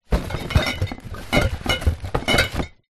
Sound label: foley bottles wine in cardboard box movement